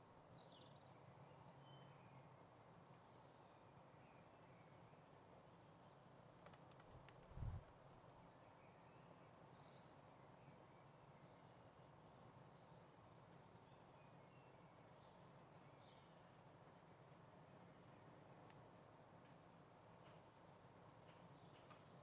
dusk rainfall and bird song

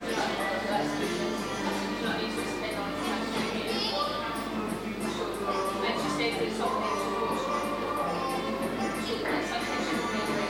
annoying child in starbucks with his furby

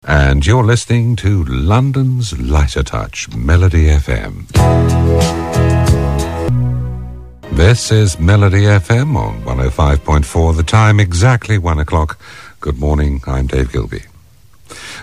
melody

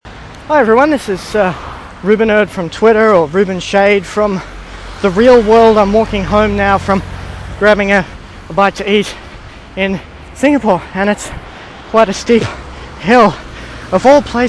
walking up a darn hill

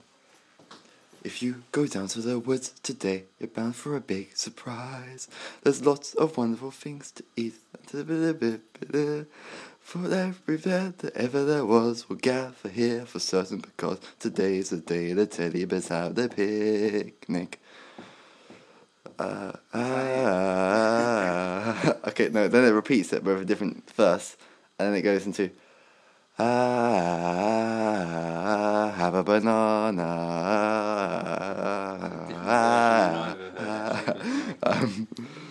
choir song

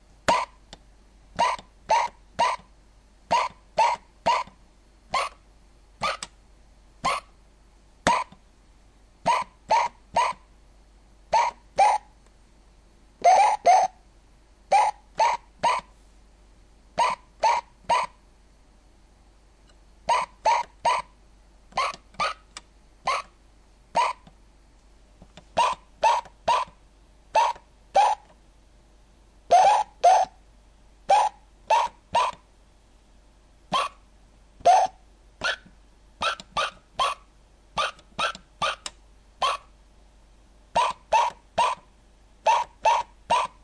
on a toy piano

